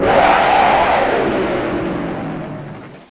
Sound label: alert; svencoop; gruntsparty; garg